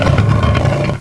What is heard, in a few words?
push stone, debris; XDM